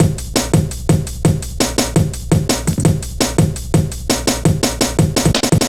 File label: Step Ez, Drum Loops, Jungle, 168.5; Zero-G Jungle Warfare 1, 2 & 3